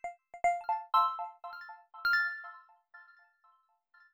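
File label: granular bell accent C major; accents; bespoke - Floral v.2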